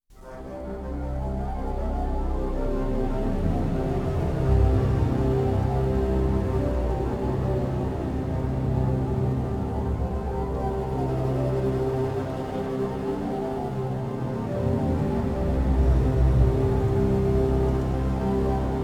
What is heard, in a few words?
drone